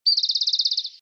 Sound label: answer correct